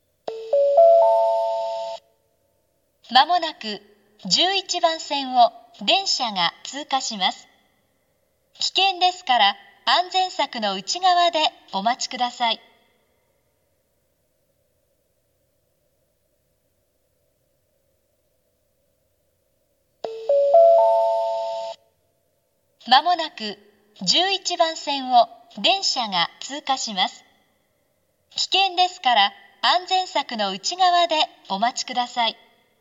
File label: iiyama pass, station; jr-east